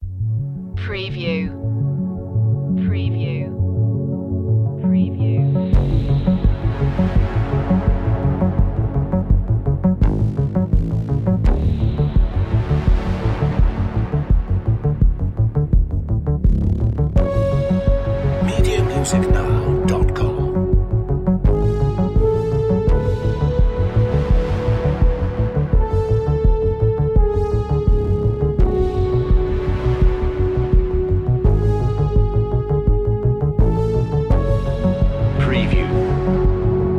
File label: bizarre stuff